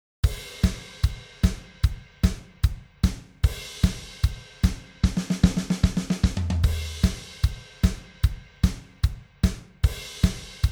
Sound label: Triolets